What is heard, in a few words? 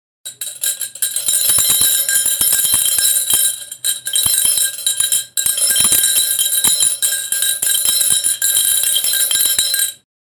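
sound spoon in glass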